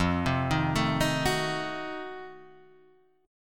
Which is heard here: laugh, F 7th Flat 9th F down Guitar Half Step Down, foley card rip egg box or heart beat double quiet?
F 7th Flat 9th F down Guitar Half Step Down